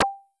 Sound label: explosion